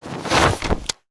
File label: fabric and clip